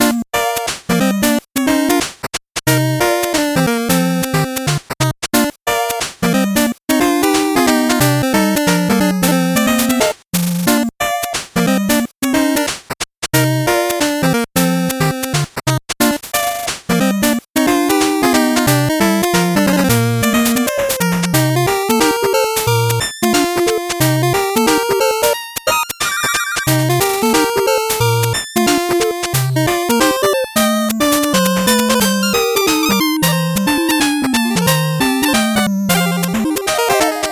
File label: 8bit bgm slow